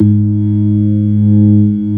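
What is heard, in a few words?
ORG B3 G#2, organ; Best Service Gigapack 2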